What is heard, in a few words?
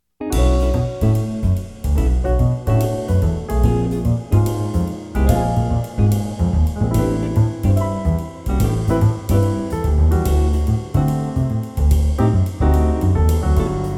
Jazzy Cat; love death realtime lovers